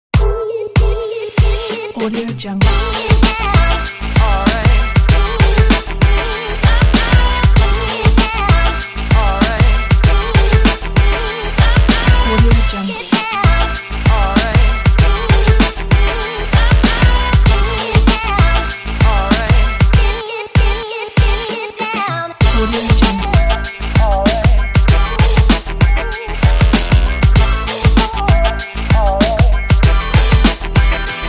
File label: hip-hop